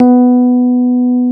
electric pianos; Wizoo Electric Pianos

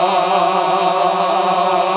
GOSP. AAAHH

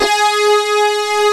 LINEAR SYNTH

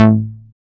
Dance Bass; Sound & Vision - Gigapack II CD 1

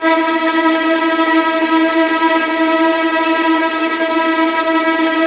VIOLINT F#4, Synclavier Strings; ILIO

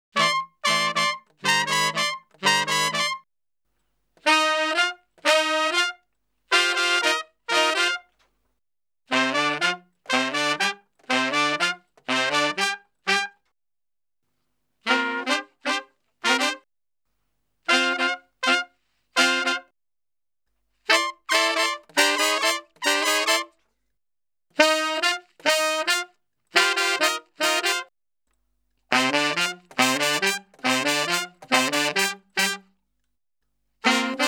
Salsa Picante; Masterbits; World Zone VOL-3

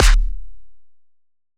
kick layer

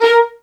MED ATT A# 5, soft violins; Q Up Arts; Denny Jaeger Master Violin Library

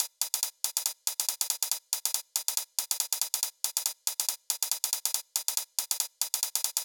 drum Loop 140BPM HH

